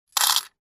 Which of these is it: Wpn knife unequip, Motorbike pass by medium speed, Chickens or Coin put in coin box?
Coin put in coin box